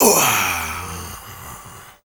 MALE SOLO, MALE NOISE, Heart of Africa; Spectrasonics; Heart of Africa 1

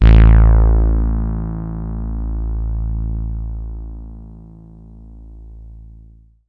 Synth Bass 4 A; Sonic Foundry (Sony Creative Software); Ugly Remnants VOL-2; Basstuff

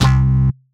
bass stab

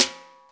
BRUSHES, JAZZ

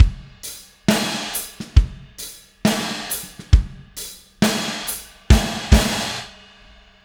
Drums Fill, Indie Rock; Sonic Foundry (Sony Creative Software); Indie Rock Anthems; Session 04 - Dubwyzz

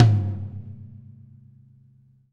R B Rack Tom B Close; Toms; Sonic Foundry (Sony Creative Software) - Drums from the Big Room The Mixes; R&B One Shots

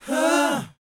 BOYZ, Vocal, R&B Dance

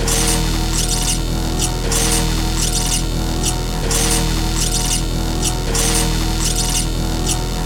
ATMOSFX; Best Service; Advanced Mega Effects

